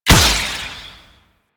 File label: Spike Gun Shot; Enemies; Warframe; Infested; ZealoidPrelate